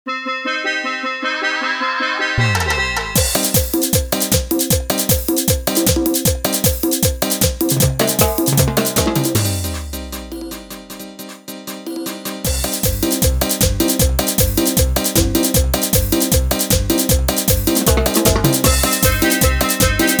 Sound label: Instrumental Carnaval BPM 155; Ecuador